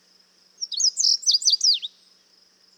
indigo bunting

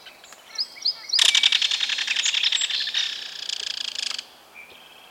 belted kingfisher

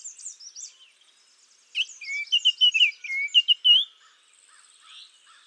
baltimore oriole

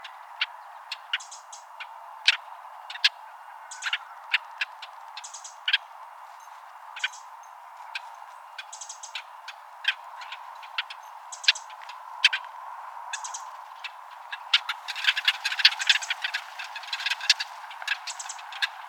brewers blackbird

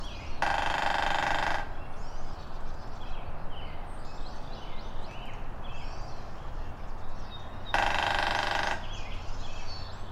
northern flicker drumming

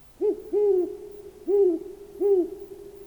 great horned owl

